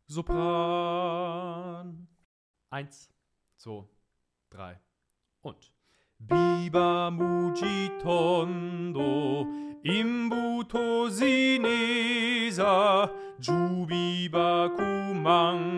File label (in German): Sopran allein